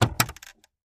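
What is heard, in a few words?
sedan glove box open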